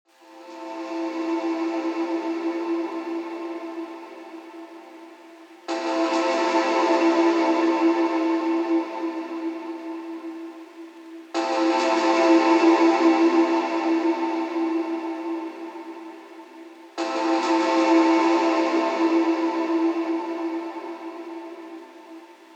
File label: Breath of Air Pads